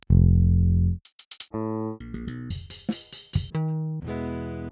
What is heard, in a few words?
Pultec; Virtual Analog